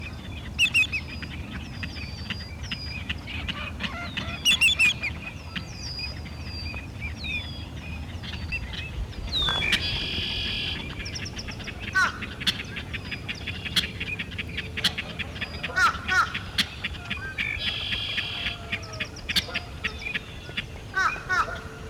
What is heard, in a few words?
saltmarsh sounds